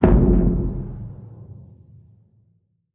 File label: tardis land; p00gie